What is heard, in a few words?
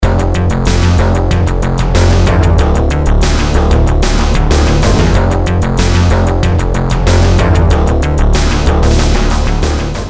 bass